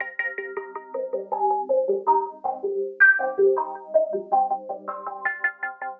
synth, ambient, 160, C; Keys; eJay

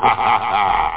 evil laugh; backbone

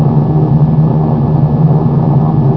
eng; BOMBER III™ (DEMO)

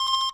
battery critical; soundscheme for windows

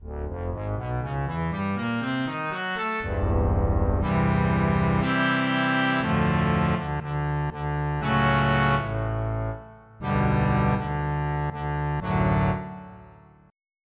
Omniverb Dry

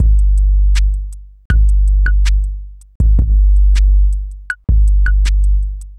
DRUM LOOP ambient 160

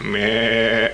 sheep; Little Red Riding Hood; Koch; Storybook

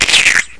spit; mordor

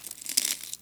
guard; kult

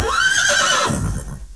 horse empty trash; 250 Desktop Themes

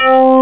stab

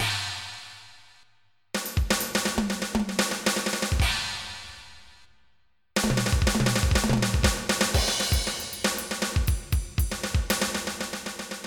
drum solo

